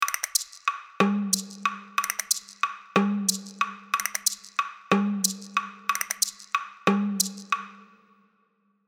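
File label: Drums Percussion; Pikmin2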